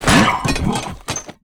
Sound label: STONEKNIGHT WAR DAMAGE